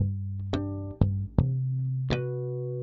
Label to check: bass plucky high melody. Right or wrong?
Right